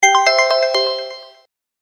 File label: samsung sweet sms